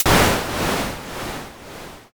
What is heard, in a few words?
thunder